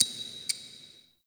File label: Clock Tick; Warframe; Cephalon Weave